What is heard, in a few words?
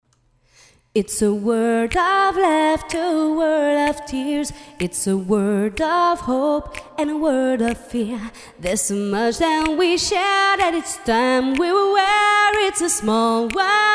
Small world acapella